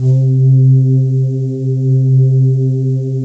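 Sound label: PAD, atmospheric pads; Best Service; XX Large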